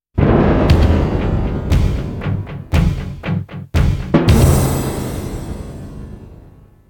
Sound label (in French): Etrange link, jingle; Jingles medley